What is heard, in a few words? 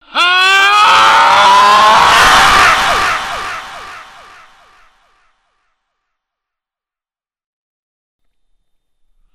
scream